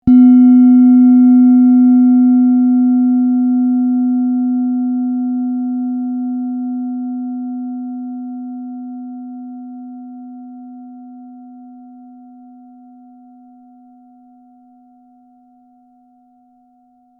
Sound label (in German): klangschale sonne